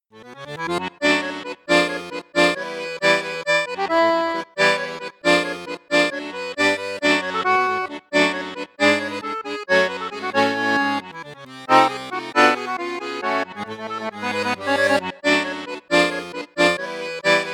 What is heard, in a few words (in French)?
registre basson flute boite, accordeon